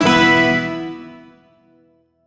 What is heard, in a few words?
Shawzin Chromatic Chord; Warframe; Duviri